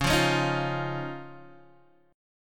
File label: C sharp Major Add 9th, Guitar, Open G